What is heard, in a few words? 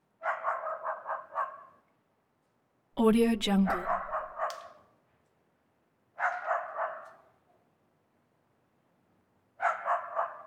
dog small bark semi distant